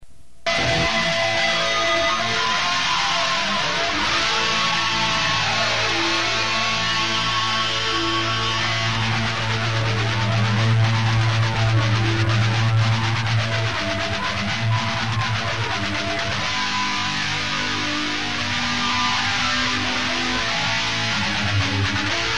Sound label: Metal D